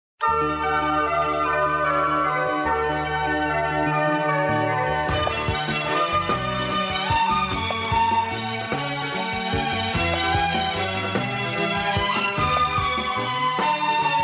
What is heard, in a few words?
Christian Devotional Karaoke